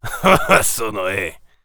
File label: warrior conversation emotion laugh; gamerip